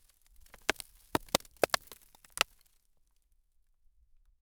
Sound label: someone drops a bunch of